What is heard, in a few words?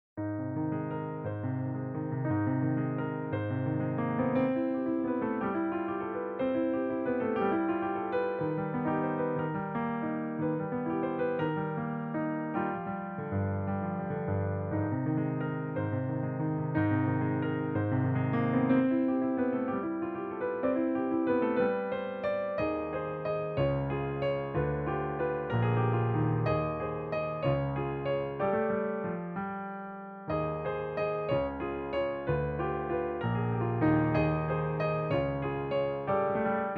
Waltz